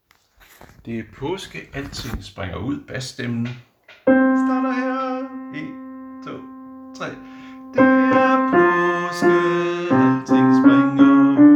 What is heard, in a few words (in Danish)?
bas; harlev koret